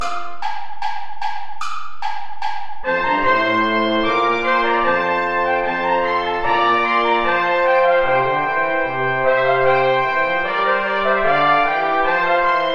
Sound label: ballet play along without trumpet